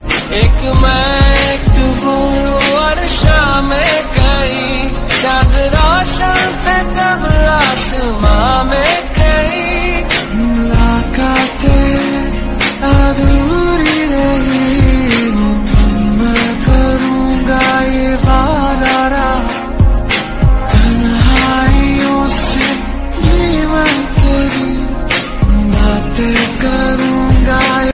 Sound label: Hindi Song Ringtone